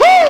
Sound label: Vocal Oneshots; Vengeance Samples; Vocals